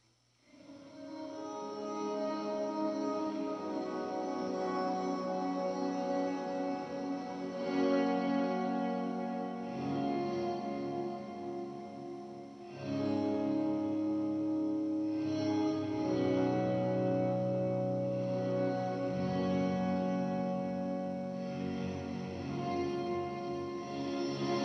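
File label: square drone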